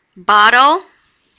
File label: bottle